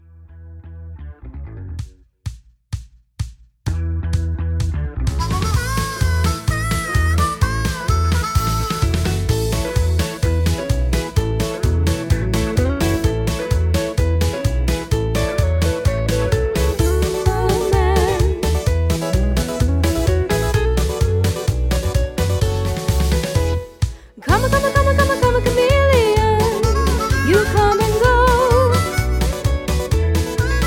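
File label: Instrumental with background vocals; Singing Calls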